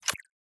Drone Ability Use; TANK; 3Souls

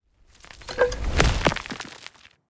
npc stone golem big strike

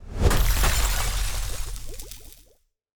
Box Flask Explosion Big; TANK; 3Souls

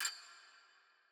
ambience room metal base

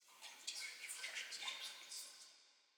ambience room shadow room working layer

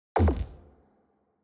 ui battle begin low layer

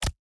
mob damage

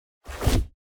crusader strike whoosh

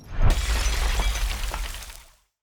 Box Flask Explosion Small; TANK; 3Souls

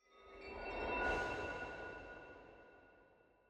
ambience room witch lodge magic

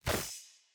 witch projectile; Shelter

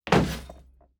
zone barricade hit

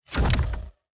environment building upgrade low

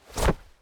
object grab textile

